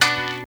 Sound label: PIA FX, Hip Hop R n B; USB Soundscan vol.19; AKAI